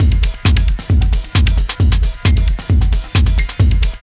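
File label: drum loop; X-Static Goldmine 3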